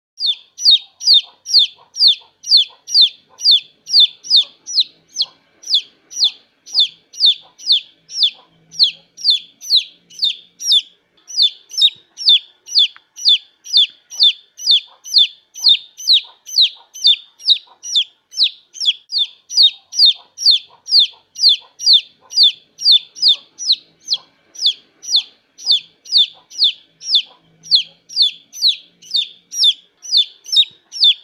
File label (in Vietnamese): Tieng Ga con keu